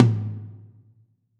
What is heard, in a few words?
Drum Set